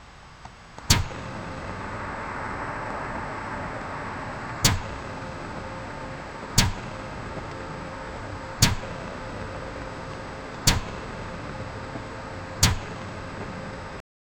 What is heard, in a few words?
server startup sound; bouncing